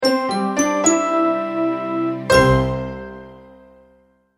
multimedia logo, Musical Identity/Signature; Short Musical Signature / Ident; Sonic Logo; Sound Design; McKinney Sound

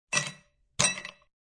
Ice Cb Thrw Two, Two ice cubes thrown into glass.; Party (Sound Effects:Bars)

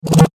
Reptile Gun Zap; Laser Gun Sound Effects

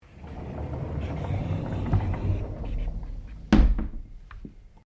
Door Sliding Interior Aluminium Glass Close; Various doors

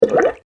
Big Water Drip; Water Sound Effects

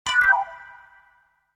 Short Multimedia Alert Effect, Chime; Chime Sound Effects; Chimes (Sound Effects:Multimedia); Stuart Duffield

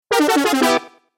Videgame Failure Fx; Video Game Sound Effects

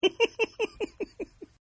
Giggle Male; Laughter; Partners In Rhyme

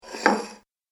Saucer Slides And Placed On Table, dishware; Household Sound Effect; Home, Household, Restaurant, Diner, Club

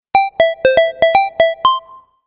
Retro Game Melody; Video Game Sound Effects

